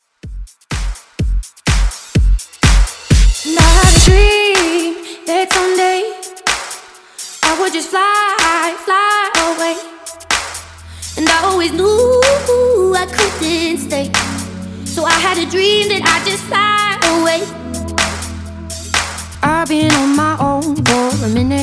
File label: Top40 Redrum